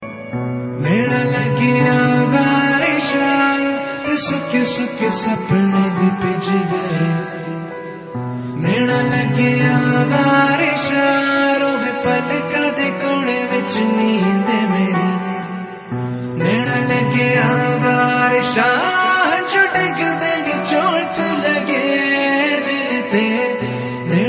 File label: Male